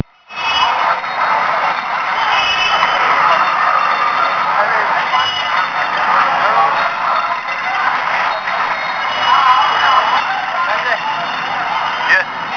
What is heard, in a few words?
varanasi street; Varanasi streets, from the back of a rickshaw, Varanasi, India; Each of these were originally recorded on my trusty Psion